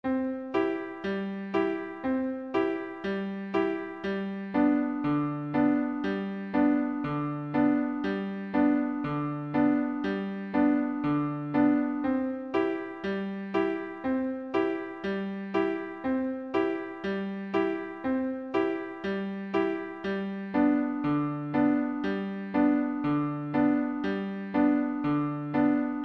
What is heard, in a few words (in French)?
accompagnement mini pompes animaux monde